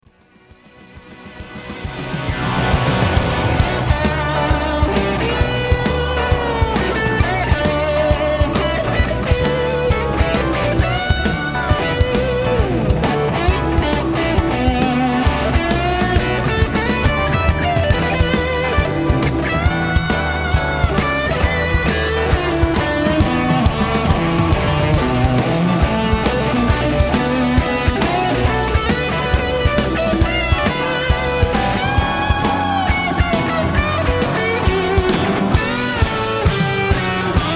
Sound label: Solo Sovtek Big Muff, Live Broadcast; Sovtek "Civil War" Big Muff; Live broadcast 2016